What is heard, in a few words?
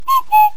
cuckoo